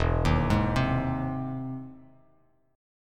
E Augmented Major 7th E down Bass Standard; E+M7 arpeggiated; JGuitar

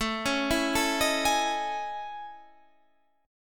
A Major Sharp 11th

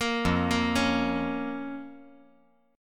F Suspended 4th Sharp 5th F

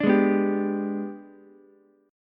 Minor Major 7th Flat 5th, Ukulele; G#mM7b5 strummed; 7,8,7,10

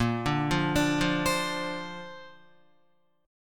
Bb Major Add 9th, Bb, down, Guitar; arpeggiated; JGuitar

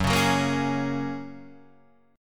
F sharp Major Add 9th, guitar; F#add9 Chord {2 4 4 3 2 4} strummed

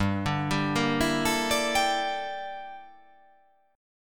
G Minor 6th Add 9th G down

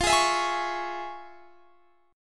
F Minor Major 7th Sharp 5th

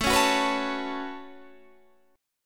A 6th Add 9th A down Guitar DADGAD

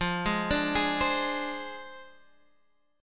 F Minor 6th, Guitar, Standard; Fm6 arpeggiated; 13,11,12,13,13,x; JGuitar

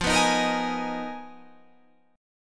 G sharp Minor 6th Add 9th F, guitar chord

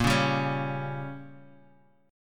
A sharp Minor 6th, down, Guitar, Half Step Down

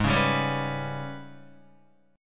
Ab Minor Major 7th Double Flat 5th, down, Guitar; AbmM7bb5; strummed

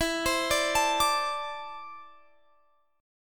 D 7th Suspended 2nd, chord

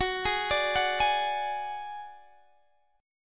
F sharp Suspended 2nd Sharp 5th, Guitar, DADGAD; chordshape; down

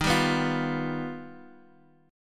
G Augmented 7th

